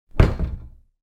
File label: cabinet door close